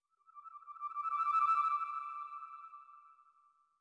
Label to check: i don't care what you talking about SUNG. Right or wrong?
Wrong